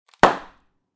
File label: Bang Clean